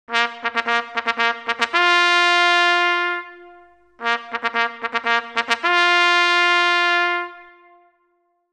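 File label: signals - end of training work, horn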